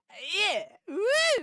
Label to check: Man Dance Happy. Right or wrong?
Right